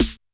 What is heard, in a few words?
E2 Analog Snare 2, Yamaha PSR-E323